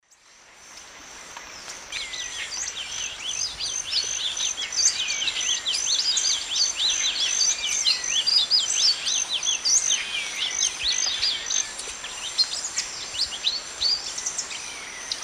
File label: Sporophila murallae, song and calls; S. America; Eastern Lowlands